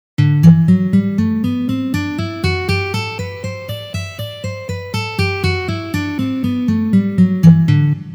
Gamma Mi minor naturalnyj vid pozitsiya

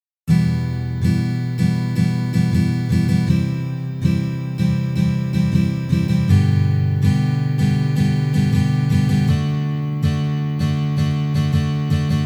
Boj Vosmerka na gitare

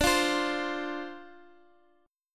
Boj Dm7

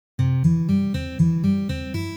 Voshodyashhie patterny

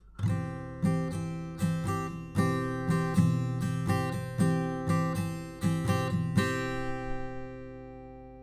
Akkord Cm 3 lad

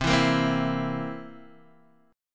akkord c7 boj

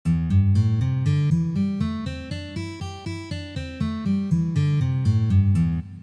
Minornaya pentatonika 1 poz

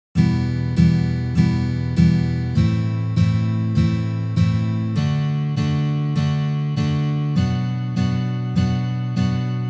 Uprazhneniya dlya igry akkorda Mi minor na gitare

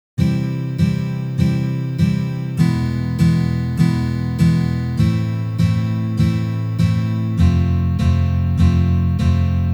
Uprazhneniya dlya igry akkorda Do mazhor na gitare

